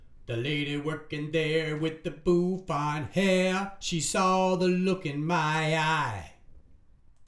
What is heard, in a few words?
vocals